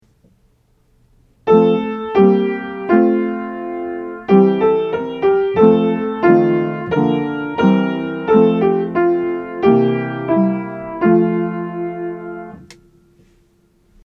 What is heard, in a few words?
Harmonic Rhythm; Aural Training exercise